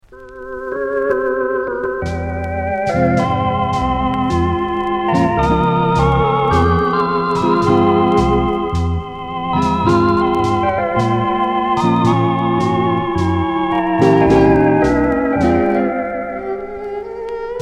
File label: orchestra